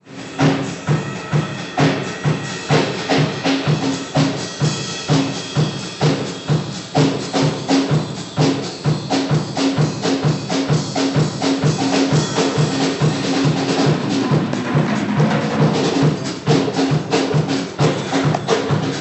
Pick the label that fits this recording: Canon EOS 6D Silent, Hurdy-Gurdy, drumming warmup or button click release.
drumming warmup